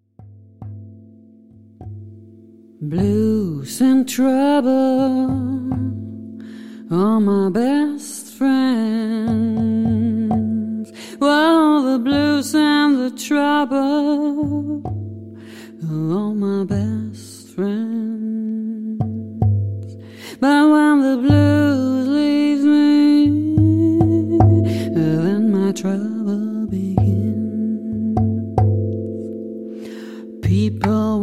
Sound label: blues